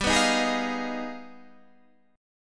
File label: G sharp Minor Major 13th G